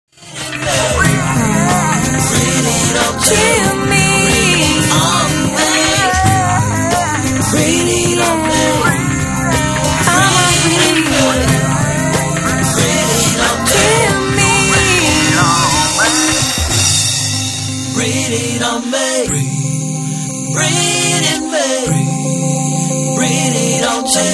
breath

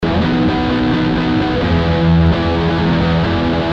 distorted riff loopable 130bpm

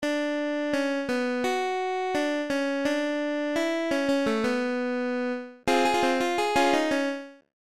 spectrofuzz lead

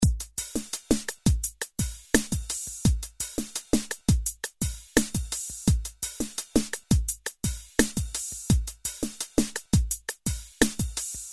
dru

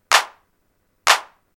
clapbreak

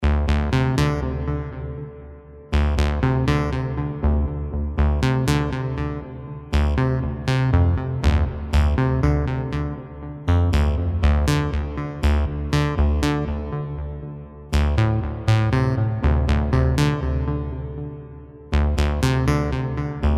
talkbass solo

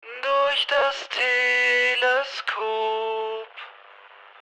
130bpm vox

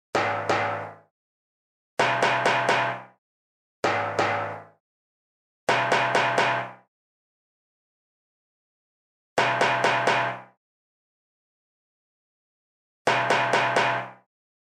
perc

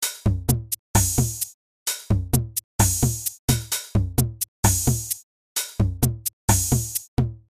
130bpm drums

